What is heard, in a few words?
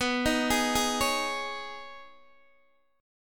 B 6th Add 9th B down Guitar DADGAD